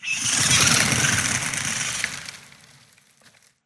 swampland bird flock; Titanfall 2

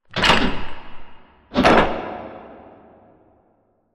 Robot Arm Pass Center Rotate Close; Boomtown; Titanfall 2

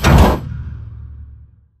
pod door close start; ext verb; Titanfall 2

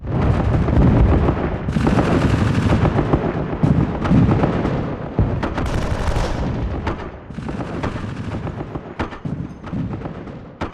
scripted distant fog battle; Titanfall 2

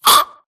grunt death neck; Titanfall 2

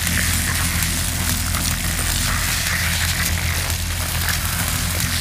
electrical death panel; Titanfall 2

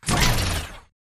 body flinch arm left leg; Titanfall 2

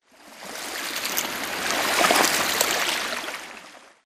swampland water lapping; Titanfall 2

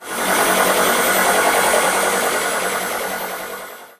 drone move; Titanfall 2

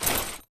Grunt Mvmt FOL Soft Short; Titanfall 2

